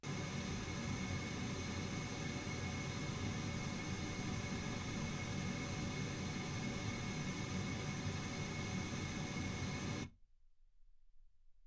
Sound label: pink noise 48kHz